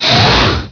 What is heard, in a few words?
fade; Descent2